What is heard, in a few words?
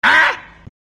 "ahhn"